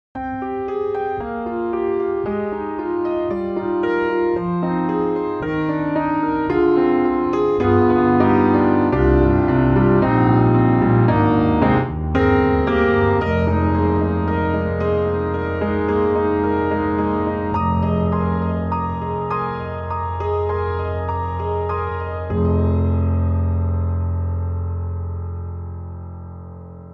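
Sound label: piano; Soniccouture; The Hammersmith Professional Edition; Rack Focus 3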